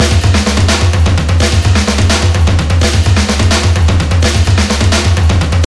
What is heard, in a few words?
drums